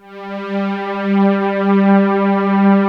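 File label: HUGE STRING G2, JX-10; Greytsounds VOL-1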